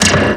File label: Zappin, Drum & Bass Hits & Bits; Total Drum&Bass; 90 s Sample CDs